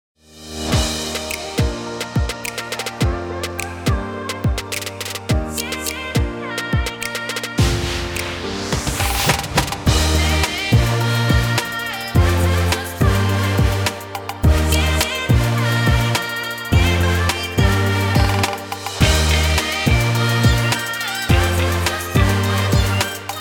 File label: BGM